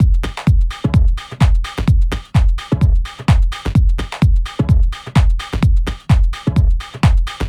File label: Beat Loop 128bpm